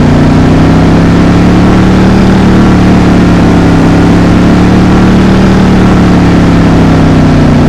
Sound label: high, engines; BRABUS 900